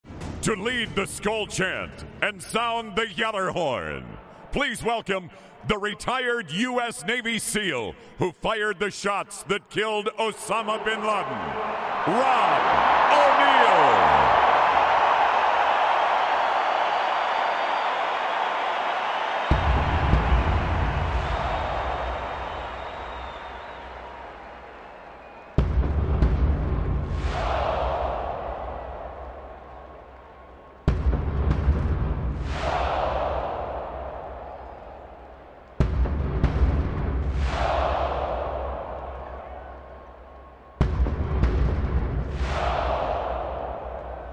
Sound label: Skol Chant and Gjallarhorn